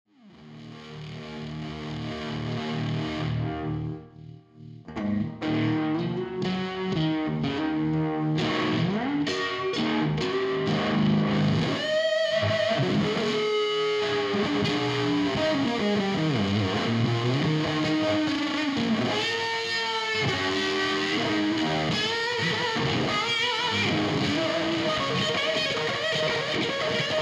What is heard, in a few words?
fuzz vibe, Effet guitare; Fractal Audio Axe-FX II